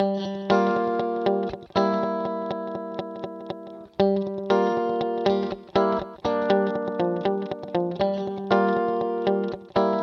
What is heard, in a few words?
Guitar Yamaha D5000 T120 Single 16 Vintage Digital